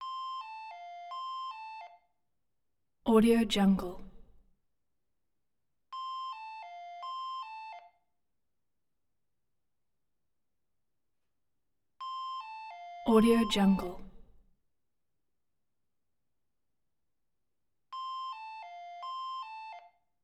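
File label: interior phone ring at t cordless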